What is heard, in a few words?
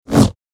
Double Bladed Saber Sound; FXHome